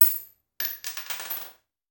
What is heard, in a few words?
Bullet Shell; Sound Ideas; Guns